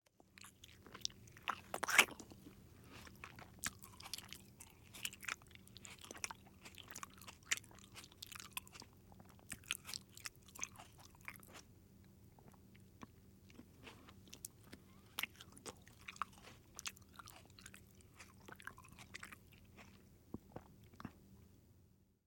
EAT, Eating; TheWorkRoom Audio Post

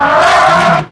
throw, zombie poison; hl2

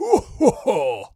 voice fun man character deep laugh; Gamemaster Audio; Fun Character Voices

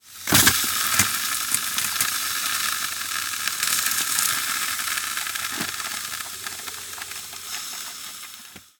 Ice Sizzle; ICEMisc; Mechanical Wave; Sound Effects Collection

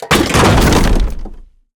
robot metal texture; BluezoneCorp; Soundwave - Robot

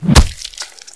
claw strike, zombie poison; hl2

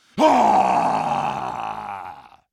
scream long, combine soldier; hl2

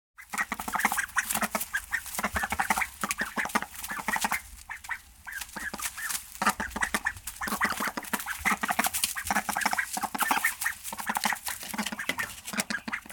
Goose Baby And Adult, Farm Animals; BIRDFowl; Mechanical Wave

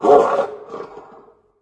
angry, antlion guard; hl2

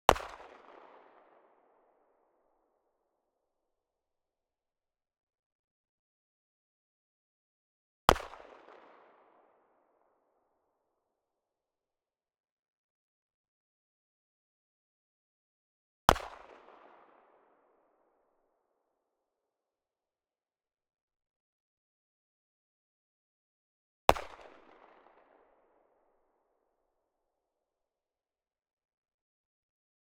Dan Wesson 445 - FIRING - 100m In Front Off Axis; Pole Position; Dan Wesson .445 SuperMag; ORTF; MKH8040

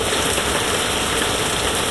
close rain; nature; hl2

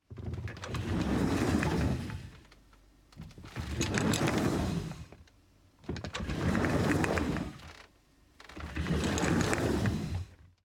Modern Seating; TheWorkRoom Audio Post

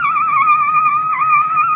voice, antlion grub; hl2

